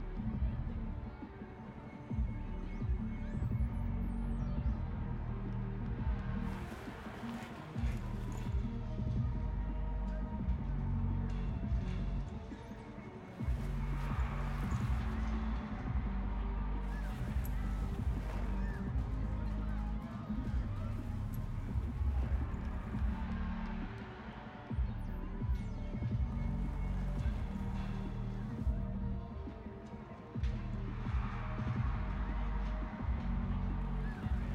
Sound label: fanfair